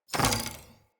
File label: Bow Shot Twang; Josalfar; Weapons